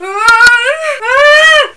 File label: F Get Up